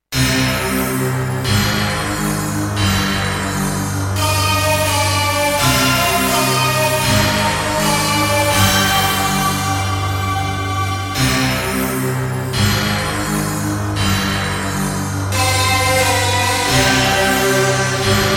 12 Bit 32 kHz Stack 2 Samples Multisample

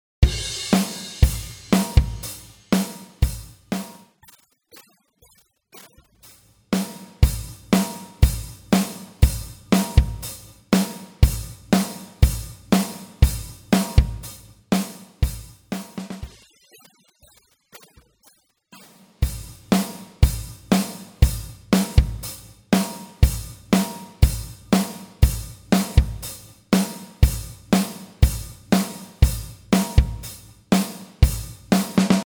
Softube Console 1 Fader Drums Spatializer

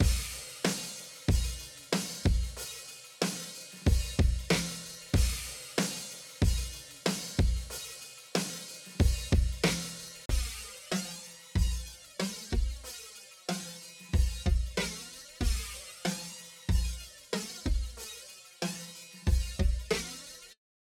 Stereophaser

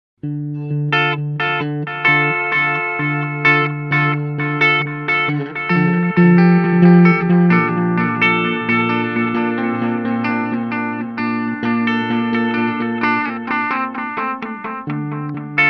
Tube Tape Echo High Speed; Fulltone TTE

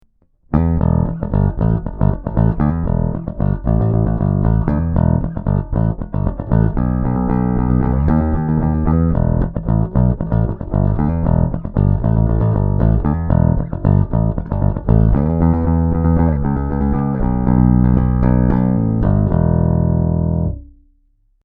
Harley Benton BZ 5000 Neck PU passive Plec

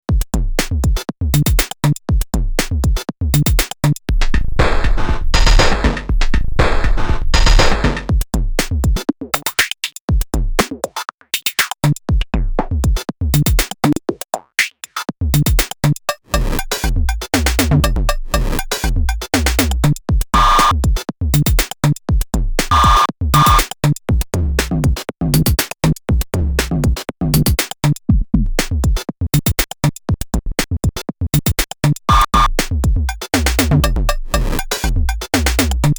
Pocket Operator 12 FX

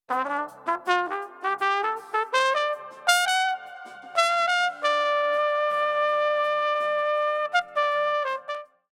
Chandler Zener Limiter TG12413 TRUMPET COMP1

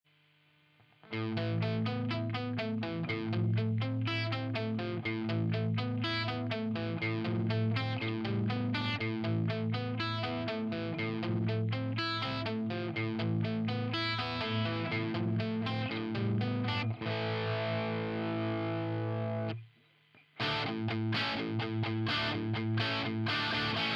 Epiphone Coronet highgain

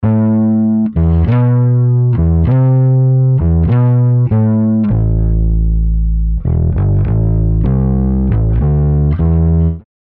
Bass Pulse Grooves FX

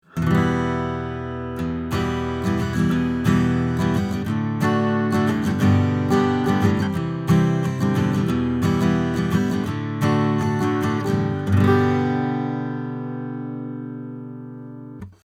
Shure SM7B A Guitar 20cm 15th Fret

